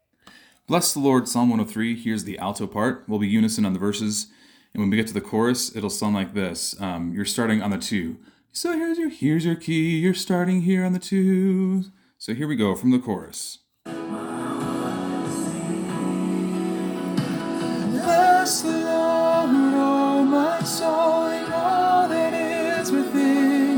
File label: Alto, Gospel Choir Tracks